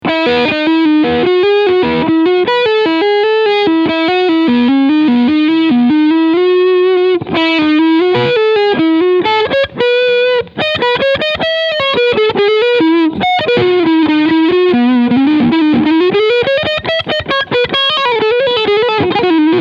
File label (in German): Crunchsound beide PUs